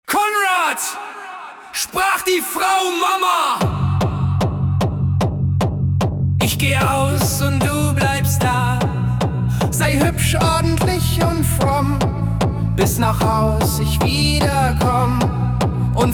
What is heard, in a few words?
Techno; Daumenlutscher